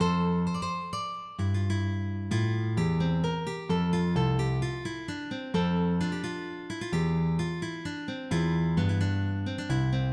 Menuet

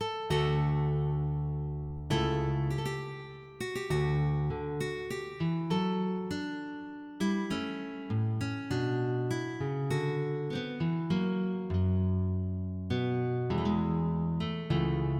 Courante, MIDI

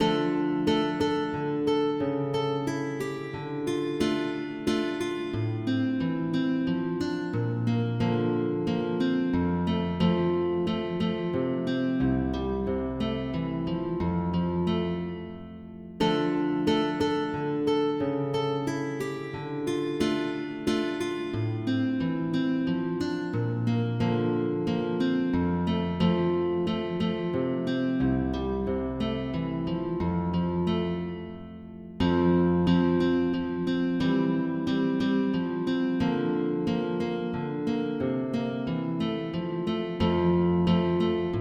Sarabande, MIDI; Le caquet des femmes

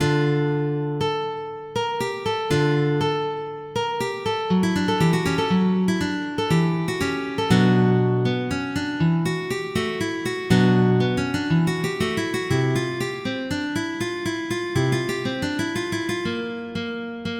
MIDI; Quelques possibilités : Propositions

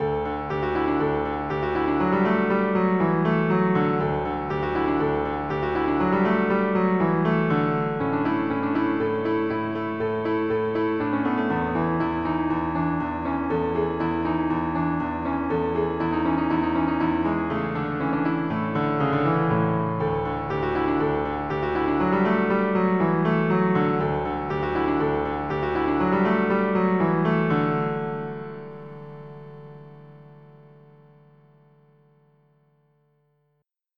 Musette in D Major

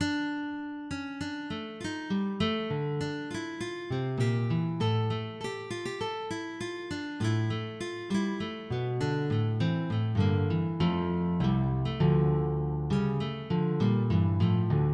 Gigue; du Vieux Gautier

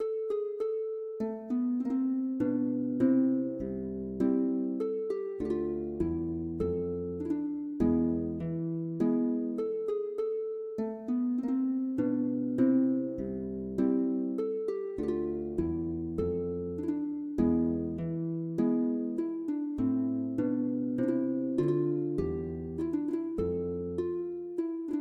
Bouree, MIDI

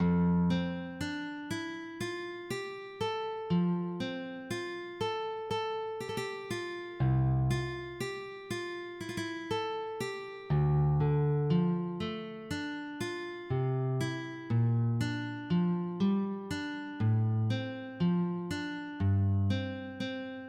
Prélude, MIDI

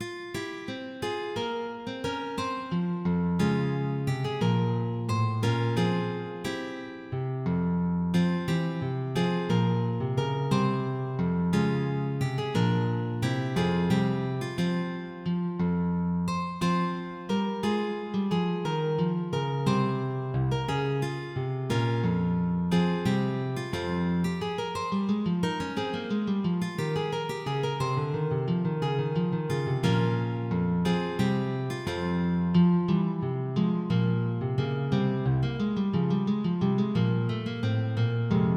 Courante

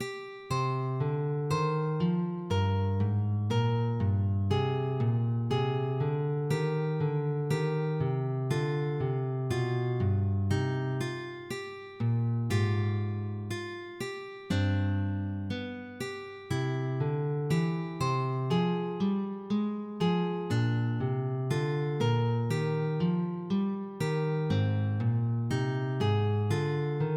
Gavotte

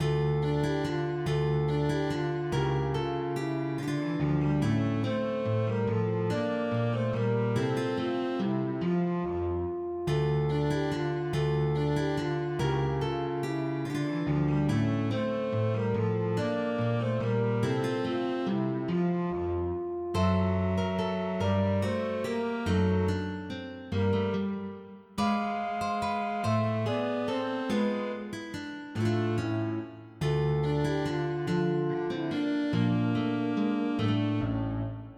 Trio presto, MIDI

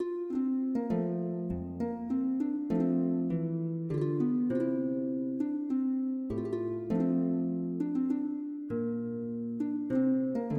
Courante, MIDI

